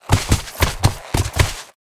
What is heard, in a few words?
Camel Mount Play Underlay